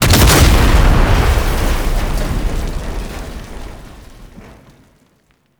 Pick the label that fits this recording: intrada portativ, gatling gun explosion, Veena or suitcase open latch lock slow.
gatling gun explosion